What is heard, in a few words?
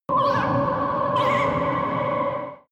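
extrinsic vocals, energy; energie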